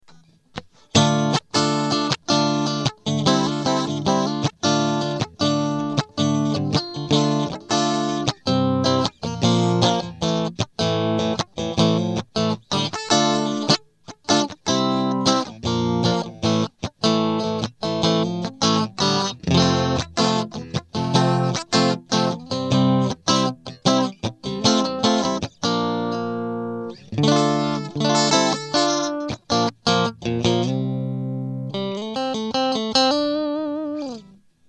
Bridge Mid Chords, guitar pickups; Ironstone Silver

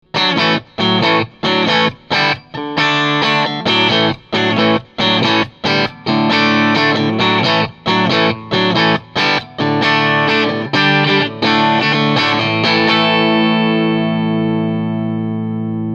Hybrid Bridge Pickup, guitar pickups

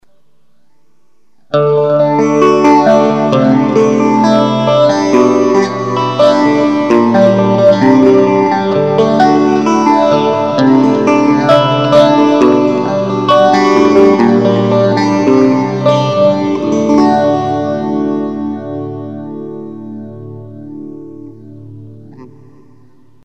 Platinum Phase Mid

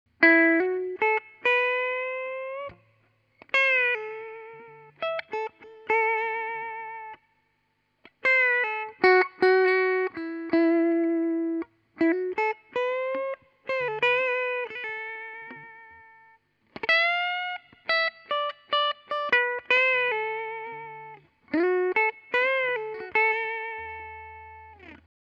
Tele series clean

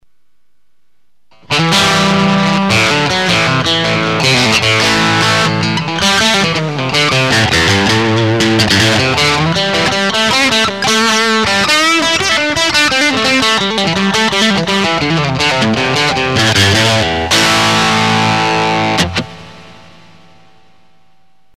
Hybrid Bridge Drive, guitar pickups

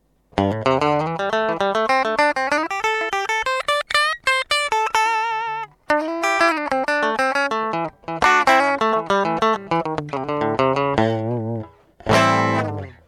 Bridge Ironstone Platinum, guitar pickups

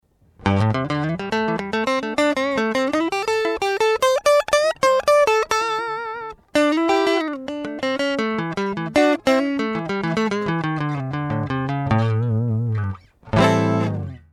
Neck Ironstone Platinum, guitar pickups

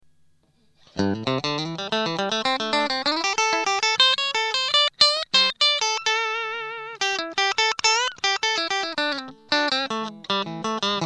Bridge Ironstone Silver, guitar pickups

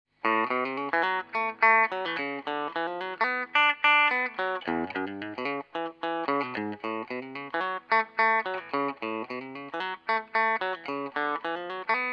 Tele Bridge thin clean

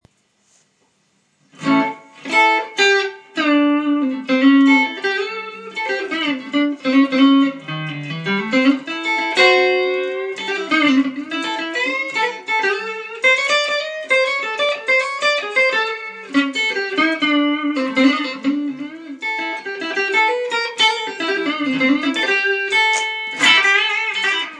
Platinum Grade Clean, guitar pickups

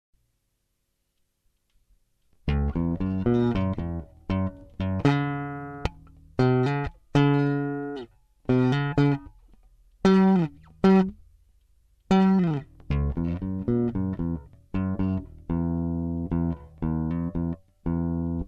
Jazz Bass without Drums Treble Up

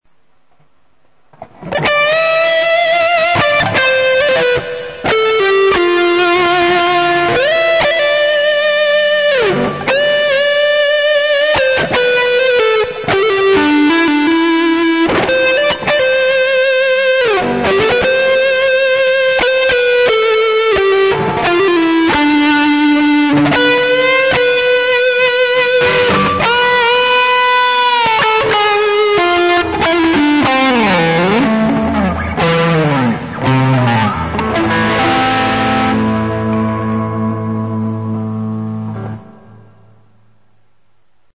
HB Neck overdrive, guitar pickups

